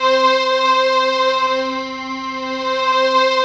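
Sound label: synth Morpheus; Sound & Vision - Gigapack II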